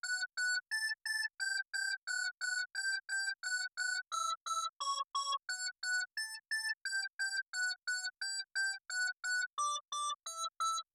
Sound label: Synth 33609 ratio 6 to 1 Before; 33609N; neve